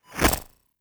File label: Glitch, noise